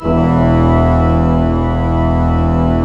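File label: pedal E2, organ pedal; AKAI S-Series CD-ROM Sound Library VOL-2; ORG PDL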